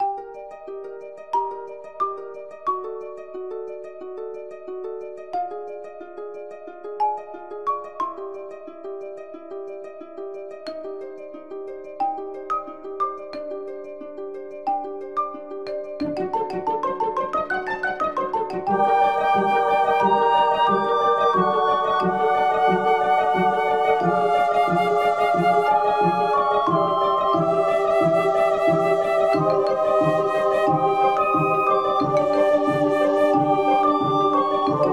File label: dungeon; tloz; zsdx